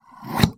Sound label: cloth scissor cut; cut chop scissoring cutting snipping snipped